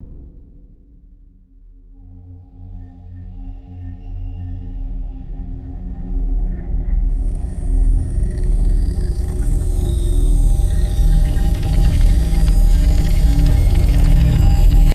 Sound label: deep pulsing hovering machine hum